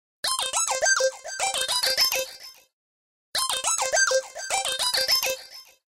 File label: Bumblebee